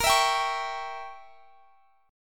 G Diminished 7th A sharp

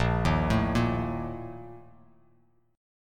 Bb Major 7th Suspended 4th, Bass Standard; arpeggiated; JGuitar

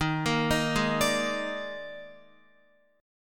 Eb Major 7th Suspended 4th, Guitar; arpeggiated; x,6,8,8,8,9; EbM7sus4

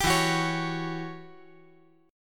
E Minor 6th Add 9th chord

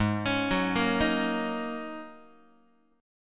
G sharp 9th Suspended 4th G sharp Guitar; G#9sus4 arpeggiated; x,4,4,4,2,2; JGuitar

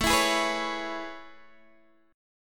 A Major 9th A, Guitar, DADGAD

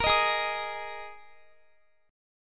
G sharp Suspended 2nd Guitar chord, down; strummed

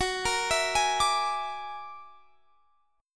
F sharp Augmented 9th, Guitar, Standard; F#+9 arpeggiated

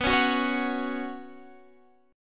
B Suspended 2nd B, chord

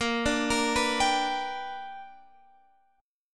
B Minor Major 7th Sharp 5th, chord

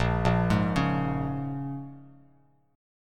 A sharp Minor 7th, Bass Standard; arpeggiated

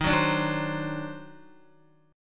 D sharp Minor Major 13th D sharp Guitar; D#mM13 strummed

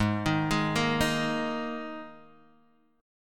G sharp Suspended 2nd, G sharp, down

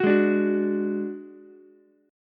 Gb Suspended 2nd Sharp 5th, Ukulele; Gbsus2#5 strummed

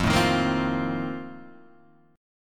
F 11th F, down, Guitar Drop D

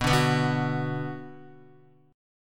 B Minor, Guitar; Bm strummed; JGuitar

